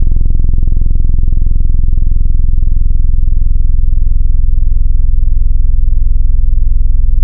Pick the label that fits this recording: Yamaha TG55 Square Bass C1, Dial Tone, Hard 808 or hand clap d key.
Hard 808